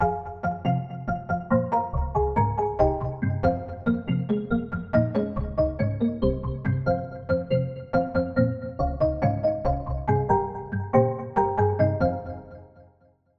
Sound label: Pluck; New Born Princess; Routed Sound; Vital Expansion - Story Walker Vol.1